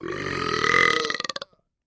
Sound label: Nasty Stinky Airy B, belching